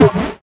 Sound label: Buttons Mechanical; Adobe; Multimedia Internet CD-Rom Flash